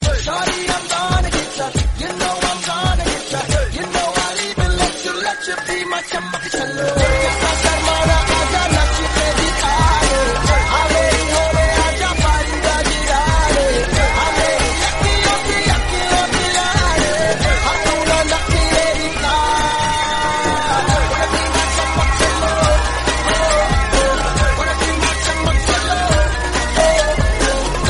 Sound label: theme bgm